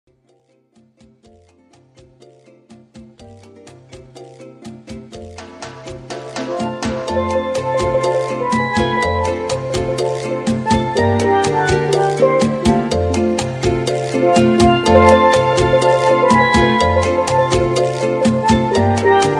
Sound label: steel drums; corporate, association, or charity event